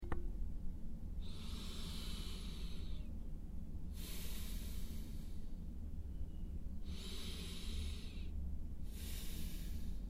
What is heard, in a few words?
Breathe